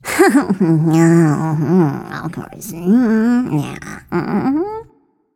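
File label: nonverbal grumble; smite; voicepack; izanami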